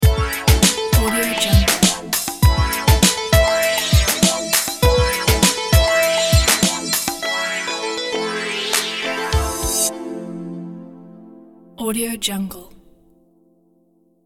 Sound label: funky intro